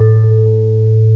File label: VIBES, MALLETS; M8; CMI Factory